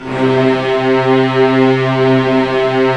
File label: STR, STRING SXN; Roland - LA Composer vol. 1